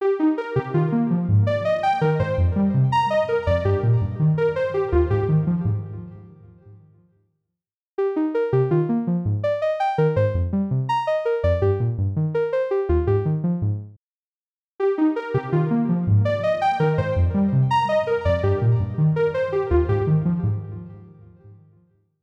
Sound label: Padify Poly Synth; UltraChannel; Preset: Padify